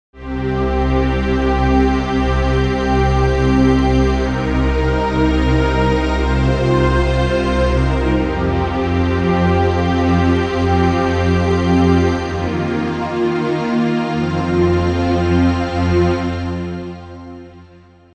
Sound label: Embracer 3D Glory Pad